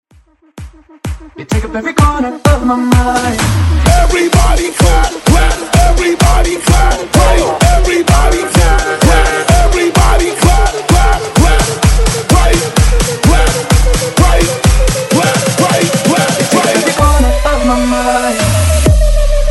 Funk Breakz 128Bpm

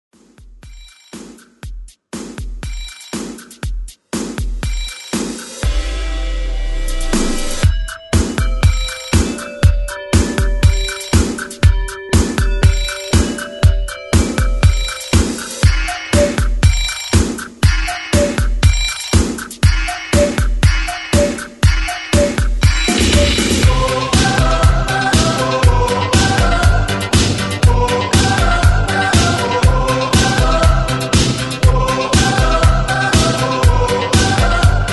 ReDrum 120 BPM

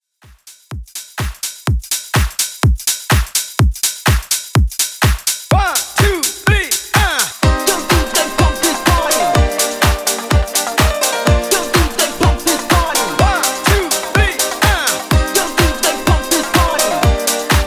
Party Classic Mix 125Bpm

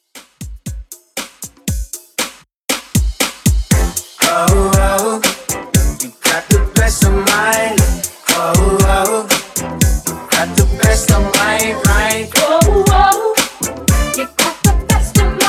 Club Edit Rework 118 BPM

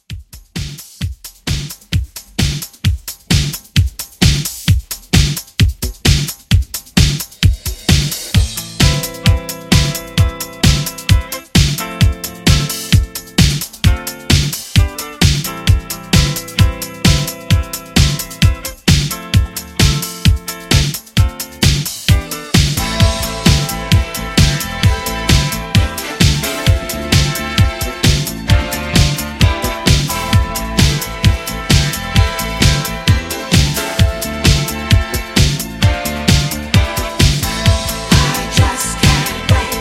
70s Re Drum 131 CLEAN

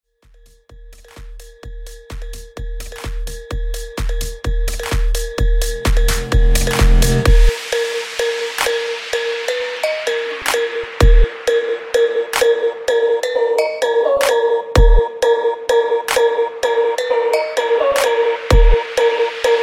Funk Bootleg Remix Clean

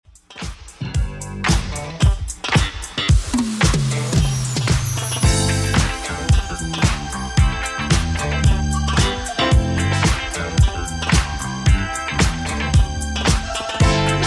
80s Redrum 112bpm

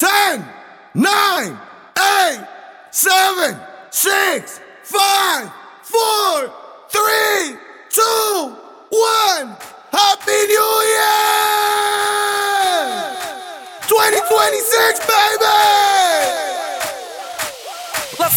100 127Bpm; 2026 NYE Edit

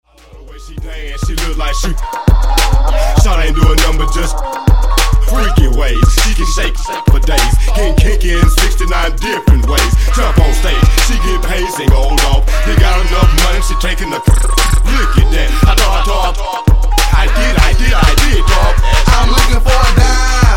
Funk Wordplay 100 Bpm

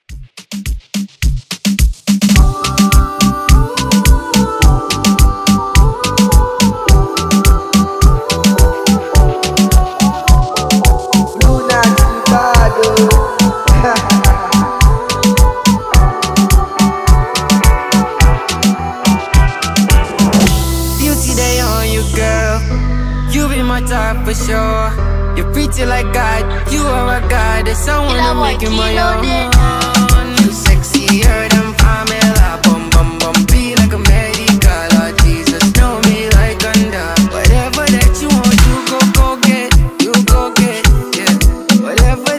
Afro Rton Redrum

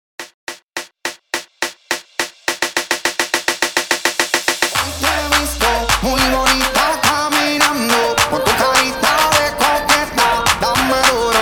CLAPAPELLA REDRUM 105 BPM

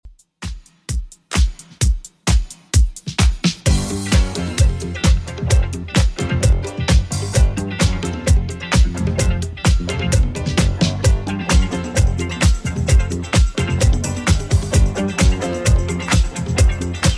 70s Redrum 130bpm

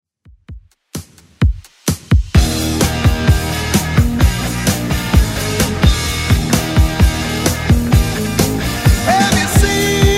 80s Rock 129bpm; Redrum

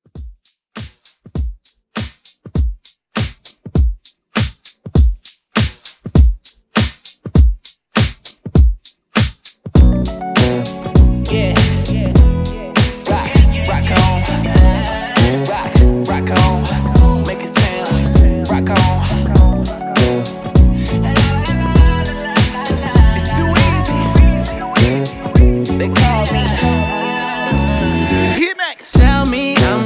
Mixshow 100 CLEAN

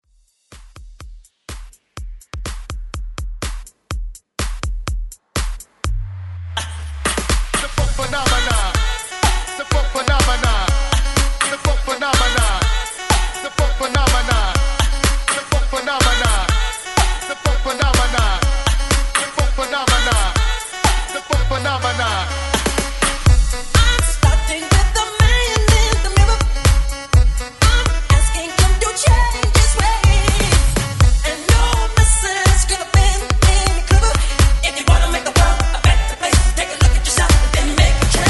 Mashup 124 BPM

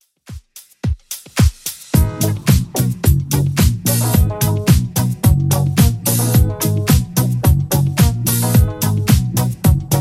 70s Redrum 109bpm CLEAN

